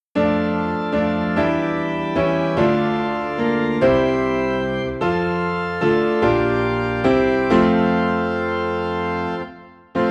Good Christian Friends Rejoice, Piano and Organ in F; christmas